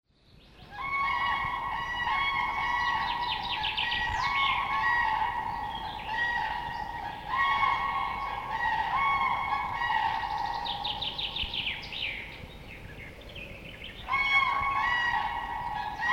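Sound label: sounds of crane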